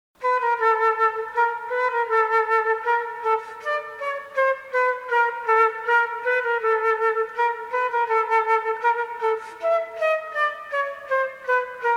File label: Little March